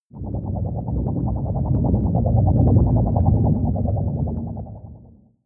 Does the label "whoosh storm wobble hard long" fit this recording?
Yes